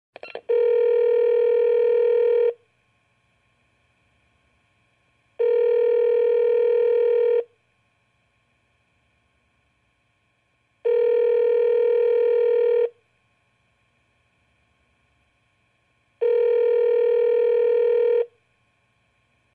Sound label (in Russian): gudok